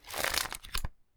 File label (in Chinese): 向前翻页; UISound